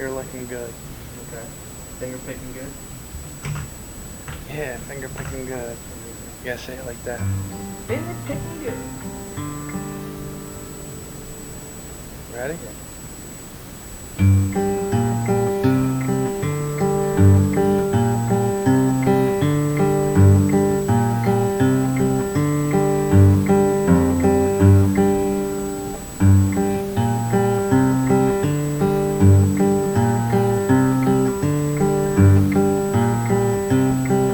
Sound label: Fingerpickin' Good